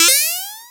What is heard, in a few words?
spike hit; sonic